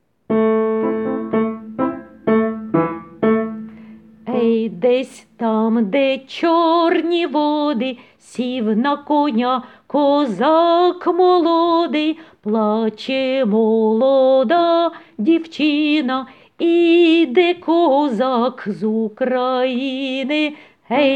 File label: He sokoly Alto